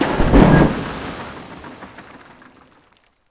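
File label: Work Boat Fort; Civ4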